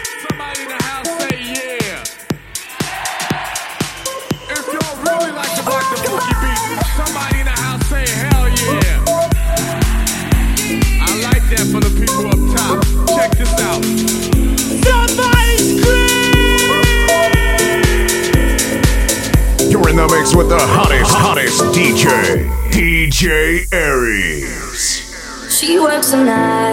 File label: Dance Remix